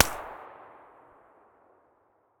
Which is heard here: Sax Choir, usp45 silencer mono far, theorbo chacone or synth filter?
usp45 silencer mono far